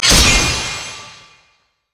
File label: audacious charge; LoL; xinzhao